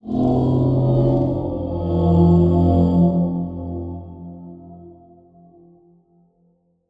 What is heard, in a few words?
Title Transition Swell